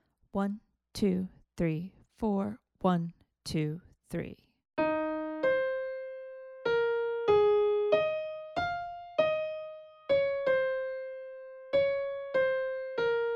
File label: melodic dictation